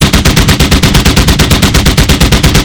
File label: Automatic M2 Browning, Weapons; DCS